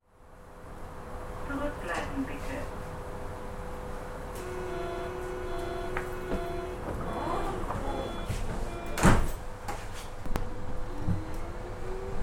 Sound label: U-Bahn